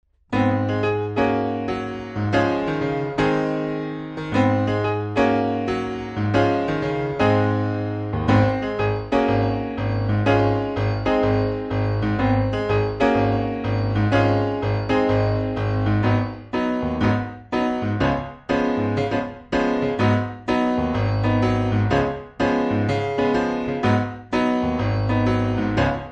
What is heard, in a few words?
pass this piano; brutus crosstown bus